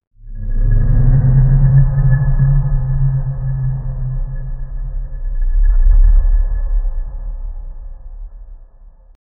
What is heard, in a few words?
deep rumbling diesel boat; slowed